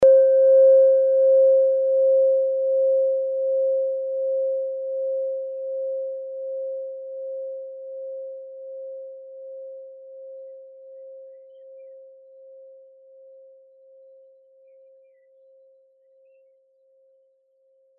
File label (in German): klangschale nepal